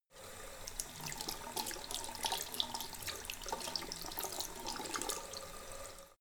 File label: sink soft flow wash; haus garten; badezimmer